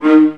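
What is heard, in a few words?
VIOLAS F3, Strings; ILIO; Synclavier Strings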